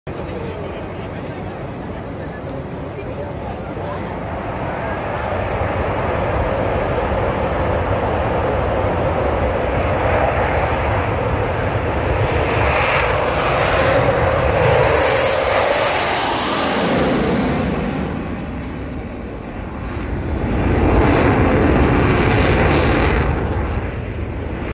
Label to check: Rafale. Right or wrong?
Right